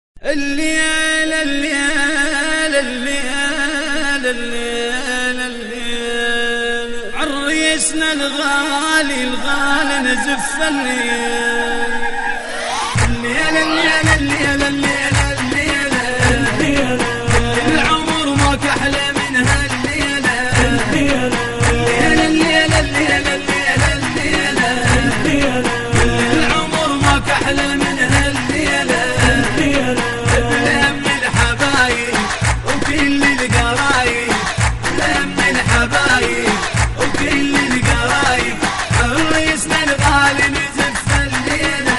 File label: music arabi araghi ahvazi